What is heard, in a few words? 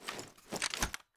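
weapon foley assault rifle raise first end; gmod; viper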